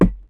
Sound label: Cone; Urban Chaos